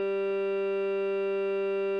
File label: g string; fourier